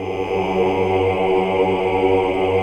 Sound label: AAH UNI